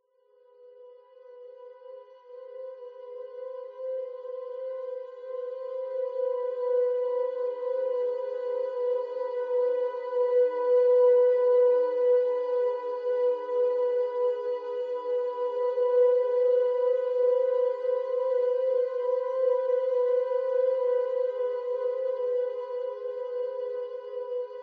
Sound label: String